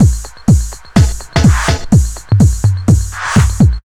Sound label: flywheel, Deep House 125bpm